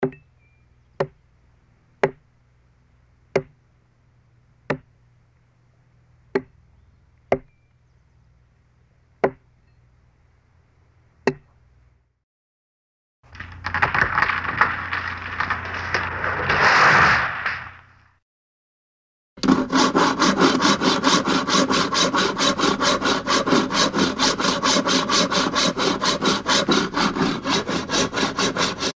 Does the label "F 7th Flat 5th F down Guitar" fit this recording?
No